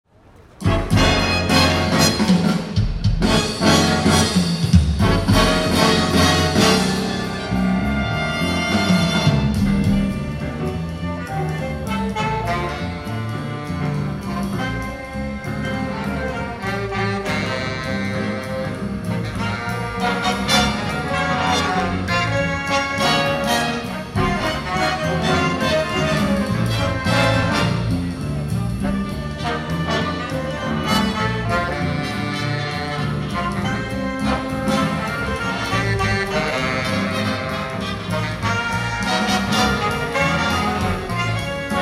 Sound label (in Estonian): jazz festival pealavalt; Montreal